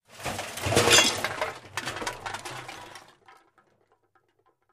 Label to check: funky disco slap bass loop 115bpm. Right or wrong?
Wrong